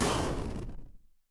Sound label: retro game explosion